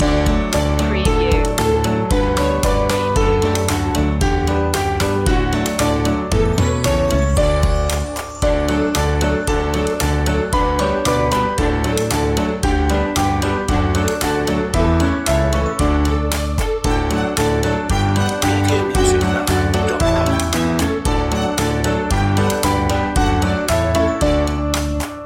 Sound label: baa baa black sheep loop